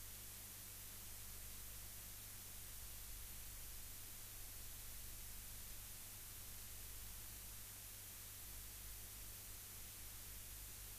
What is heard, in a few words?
rayol farm; SEAMED